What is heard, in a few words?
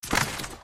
Sfx Dead